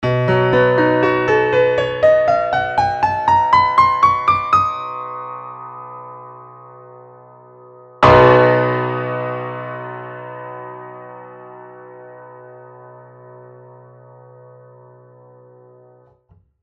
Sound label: Harmonic series